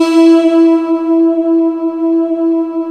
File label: CLOUDS E3, VOXY; Best Service Alpha Dance vol.2